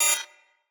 Ability Activate Error, UI

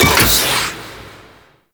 Moon Shoot Switch; Ambience; Gameplay; Orokin; Warframe

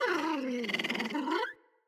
Puppy Interact Affection Open Mouth; Enemies; Vocals; Kubrow

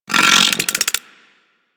Leech Projectile Bite; Leech Osprey

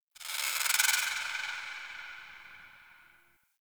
Creature Distant High; Ambience; Misc Outdoor; Warframe; Infested Eris

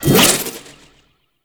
Crack Retract; Warframe; Warframes; Khora

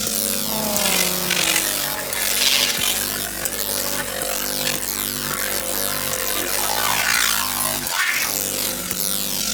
Zanuka Companion Tesla Globe Loop; Warframe; Characters; Abilities

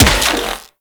Infested Rifle Charged 2D Shot; Quanta; Weapons

